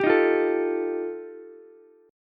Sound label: G 7th Suspended 2nd Sharp 5th G chord, Ukulele; strummed; JGuitar